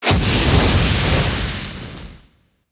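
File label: kaidan dash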